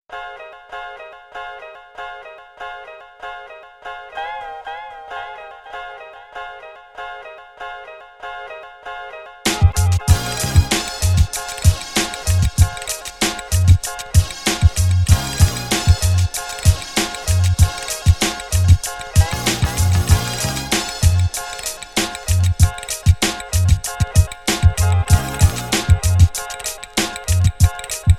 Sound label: beat